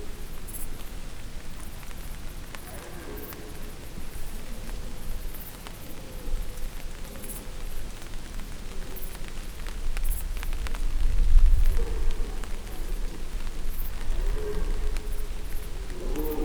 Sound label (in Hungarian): eső szél bőgő bikák, gímszarvas